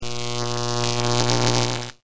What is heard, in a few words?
zap